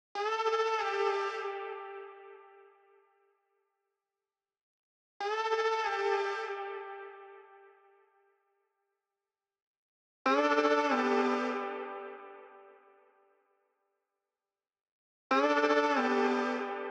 95 BPM G Maj Vocals; Loop Stems; Cymatics; Menace

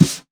Brass Brush, snare; Drums; Ghosthack - Free Snares 2023; Blk Brass

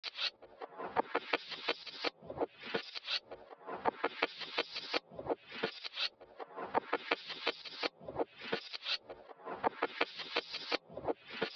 Crunch Drum Loop 83 BPM Percussion, Lofi; Cymatics; ANALOG EVOLUTION Beta

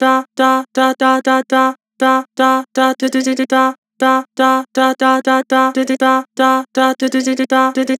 "Dah", Vocals; Harmor

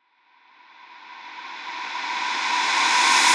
Reverse FX

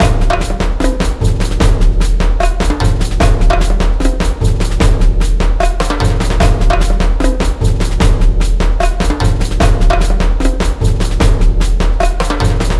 150BPM, Percussion; Percussion Kits; Ghosthack; SkyRealm; Kit 02 - No Where To Hide

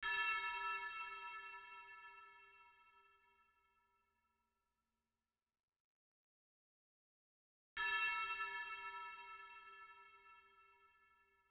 124 BPM A Min Bell; Trap Melody

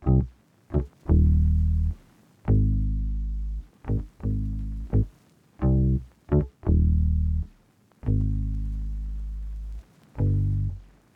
86 BPM D# Maj Bass; Soul Food

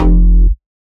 Bass Shot F

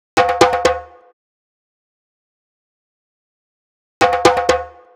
Drum Loop - 125 BPM Fill; Loop Stems; Cymatics; Fever Dream Drum Loop

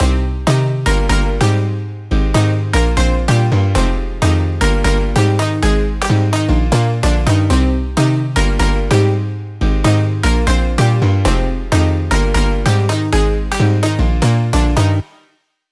128 BPM C Min, house drop loops; Halcyon; Cymatics

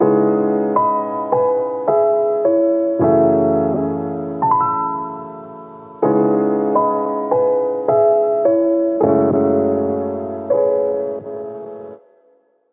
Piano Wet - 80 BPM C# Min; Melodics; Cymatics - Eternity Sample Pack

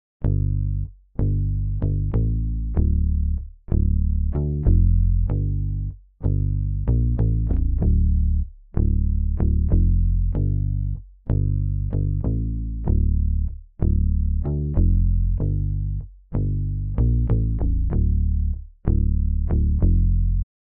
95 BPM G Min Bass; Loop Stems; Cymatics; Modern Classics

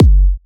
Techno Kick; Kick One-Shots; Ghosthack Advent Calendar - Day 6 - Techno Kit

